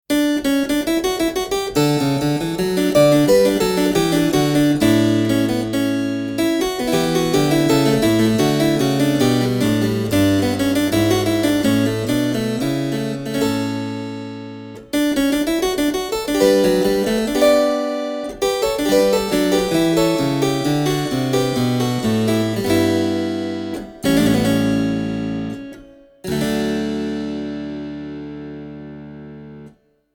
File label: Harpsichord 1